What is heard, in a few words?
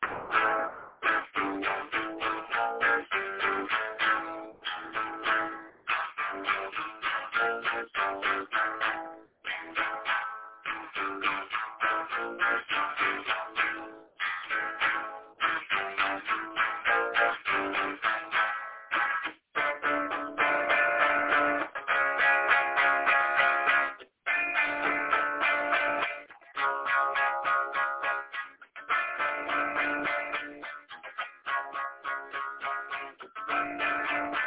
deranged spanish gypsy style march